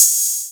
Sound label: HH, korg drums; Korg Electribe; ESX-1; electribrary-3